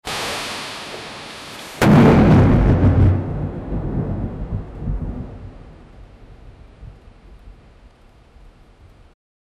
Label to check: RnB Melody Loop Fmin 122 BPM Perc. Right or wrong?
Wrong